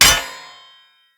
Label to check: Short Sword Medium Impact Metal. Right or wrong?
Right